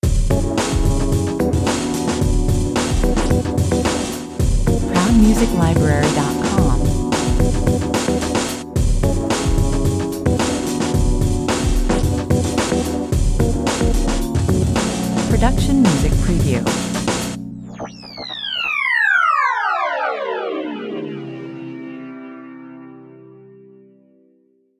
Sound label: Short and funky, this intense urban track is gritty and self assured. The prominent drums sound; like they've been pulled right out of some seventies funk